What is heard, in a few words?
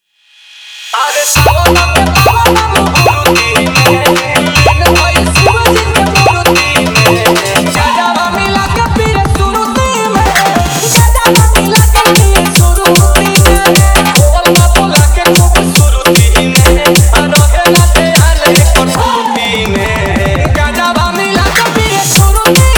Bolbam Remix